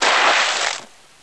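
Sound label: splashing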